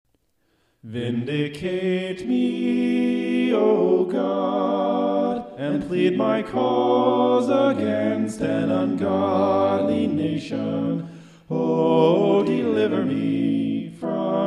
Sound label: a capella scripture song; psalm 43